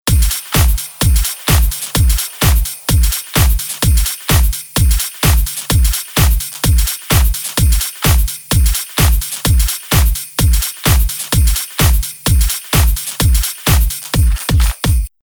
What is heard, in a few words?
Kick Beats; pack noel